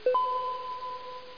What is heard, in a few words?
Chime